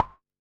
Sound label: Clave, One Shots Drums Wood